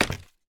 step scaffold; Minecraft

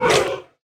bite, mob, panda; Minecraft

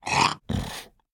idle, llama; mob; Minecraft

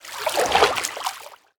swim, liquid; Minecraft

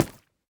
step, coral; Minecraft

